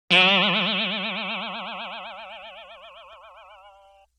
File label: Boing Box Higher; Vintage Cartoon; Hits & Tones